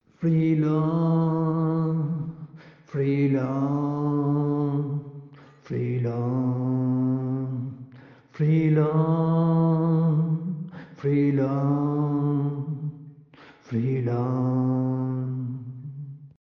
coro voz

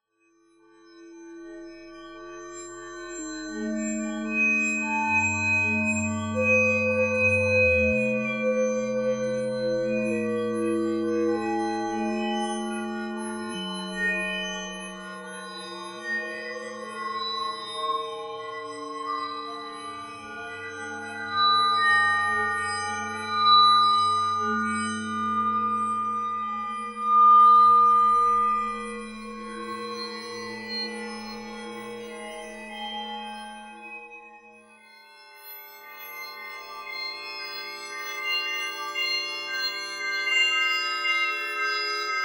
Violin procesado con GRM; CourtSociety; INA

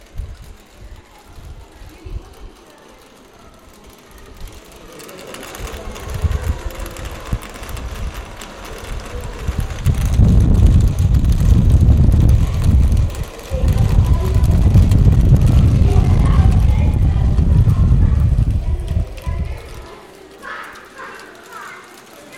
Gotas

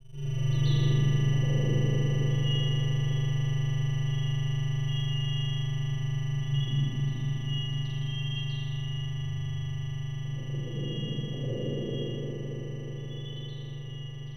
Loops mutantes

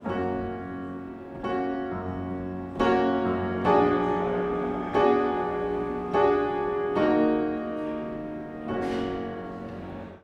Loops mutantes piano cama

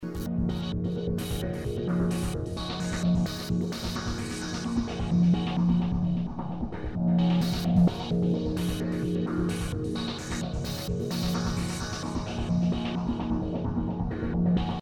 Bateria; Court Society; SH Classic

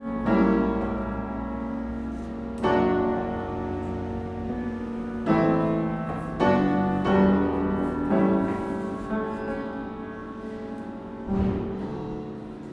Loops mutantes piano cama

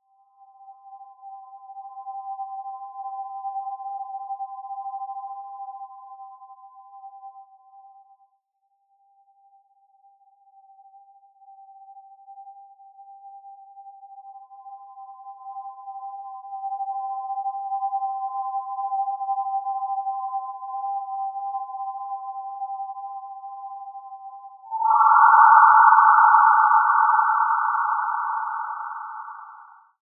Loops mutantes

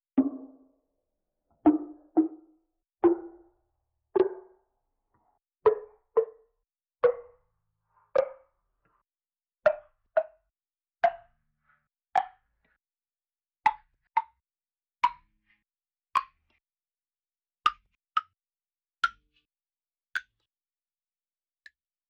Clave

